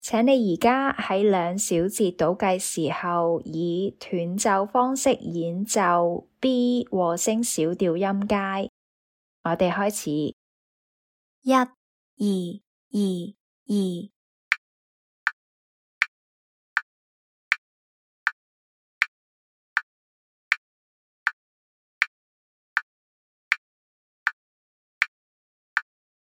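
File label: B minor harmonic scale staccato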